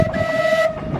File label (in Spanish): silbato minitren